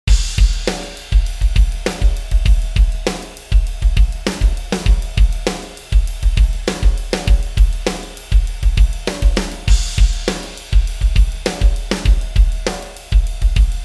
drums low pass parallel; universal audio moog multimode filter xl

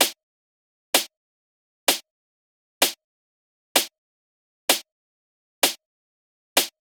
sd loop dry; Snare dry; JMG Sound Mirror

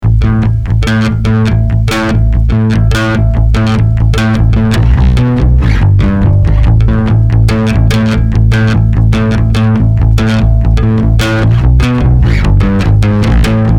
hot fusion; two notes le bass

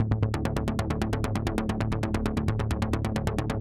bass

